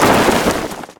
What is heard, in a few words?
cloth med